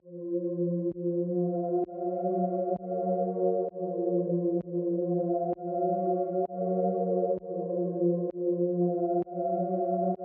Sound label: 130 BPM F Min Synth; Trap; Melody Loops; Loop Stems; Cymatics; Nightmares Trap Melody Loops; Painful Dream